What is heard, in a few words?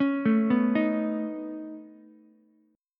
C sharp 7th Suspended 2nd Sharp 5th, C sharp, down, Ukulele; arpeggiated; chord